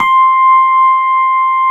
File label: SUITCASE, Keyboards Old School; USB Soundscan vol.09; AKAI